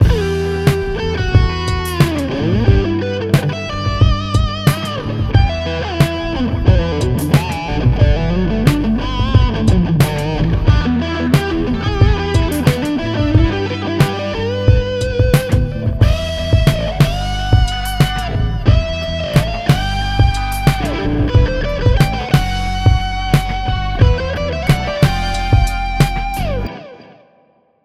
Lead and Rhythm; Preset Rack Star

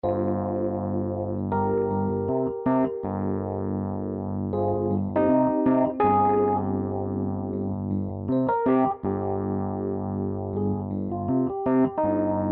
Dirtphase

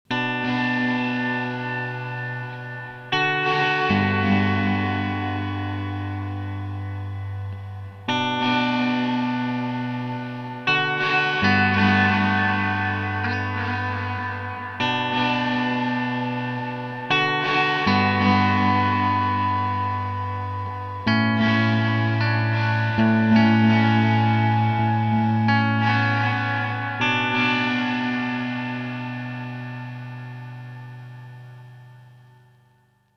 Rewind Diffuse; Alexander Pedals

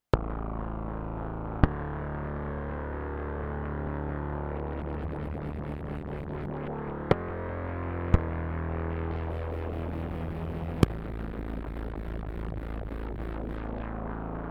Polymod Filter

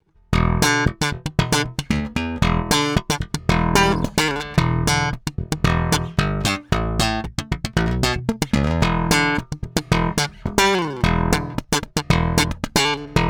SpaceBass active Slap

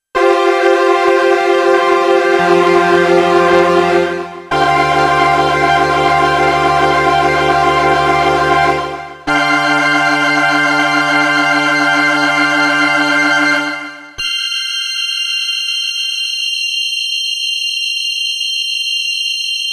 STREICHFETT

